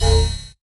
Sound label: Ricochet; Dead Sun